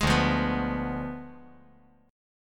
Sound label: D Diminished 7th D down